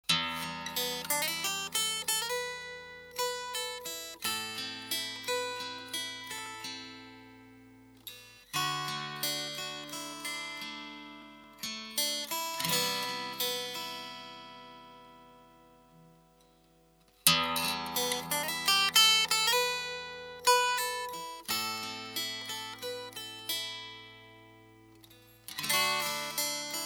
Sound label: piezo